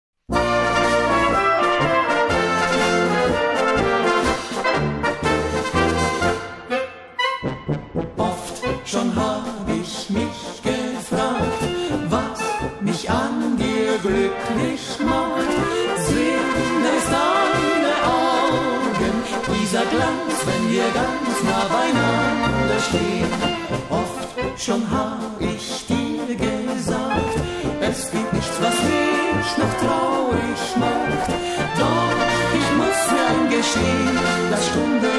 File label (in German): Polka